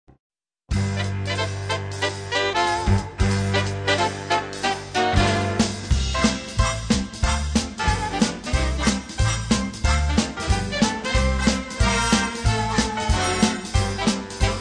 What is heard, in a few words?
Big Band